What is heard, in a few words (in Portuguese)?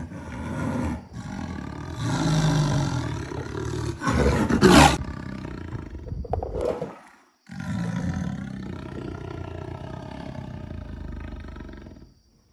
Onca pintada